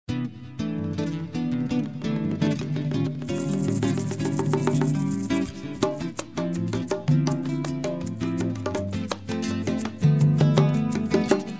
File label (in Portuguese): atirei o pau no gato